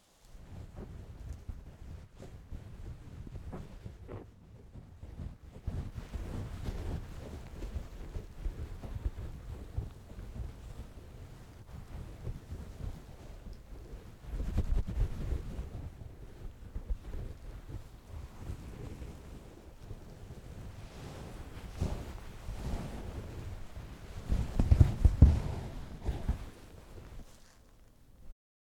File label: Scratching Fluffy Blanket